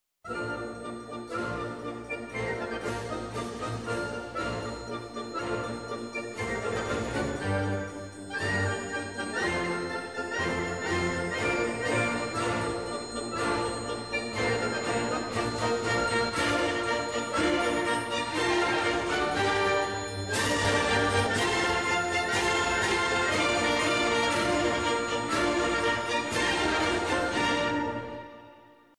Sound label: ORCHESTRAL